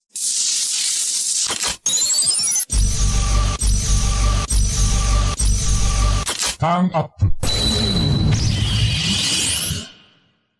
henshin sound; kamen rider blade

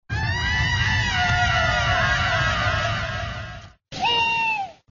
ihihiiiiiih

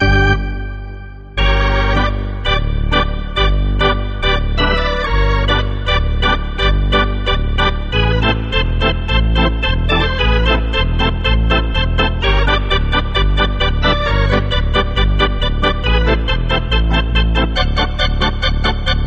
piano

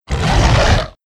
roar; spino

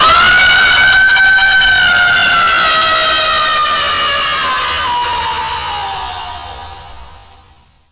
jumpscare sound; fnaf plushies 2 v4

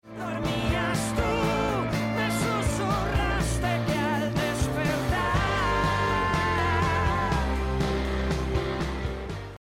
song

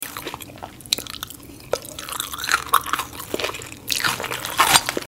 eating salad

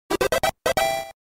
win sound correct; undertale

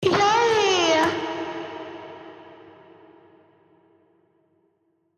yay; valorant

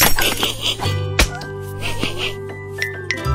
laughing; the oddbods; pogo

